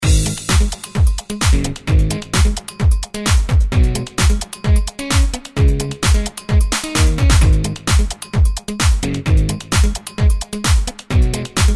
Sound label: Rock Dance rhytm; Sound Graph